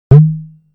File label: Wasp-Drum; drums